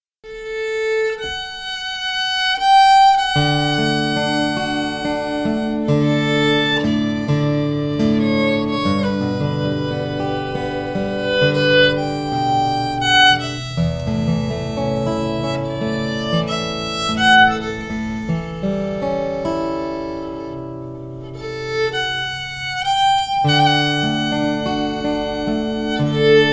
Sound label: a slow air, fiddle